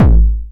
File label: 909 BD Tape Sat